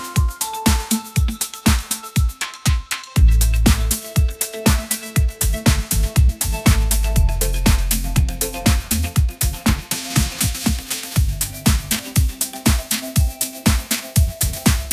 upbeat pop melody, ringtones

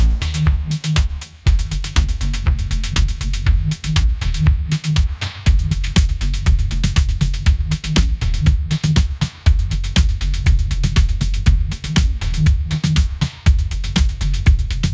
pop anthem, ringtones

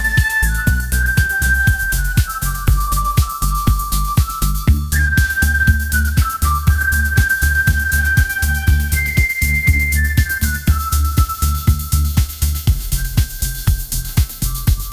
upbeat pop melody; ai

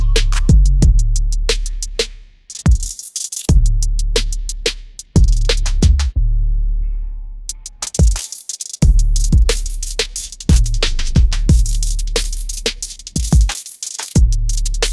hip hop beat, ringtones

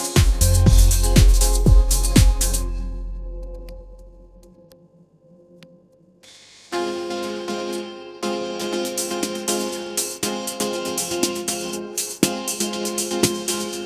upbeat pop melody

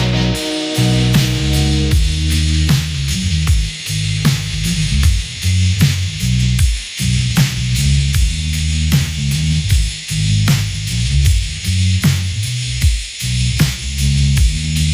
made for rocking you

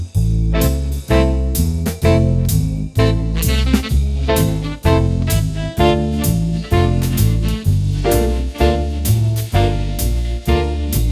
smooth jazz, ringtones

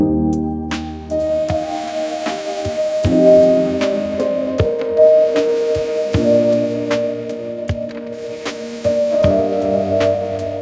lo fi hip hop, ringtones; ai

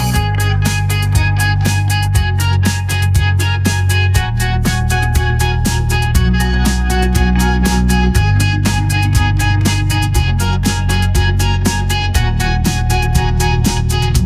upbeat pop melody

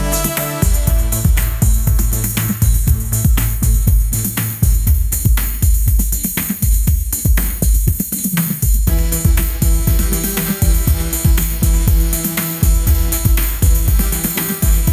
upbeat pop melody

